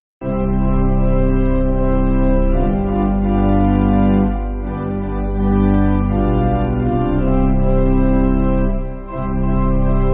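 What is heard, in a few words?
Pipe Organ, Backing Files for Churches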